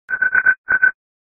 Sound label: Ring Tone Longer